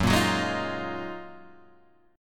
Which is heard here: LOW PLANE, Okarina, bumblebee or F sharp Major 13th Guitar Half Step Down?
F sharp Major 13th Guitar Half Step Down